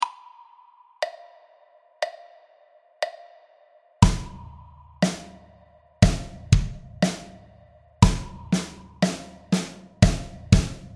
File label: 4 way, drum